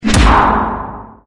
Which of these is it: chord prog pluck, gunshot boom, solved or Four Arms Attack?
Four Arms Attack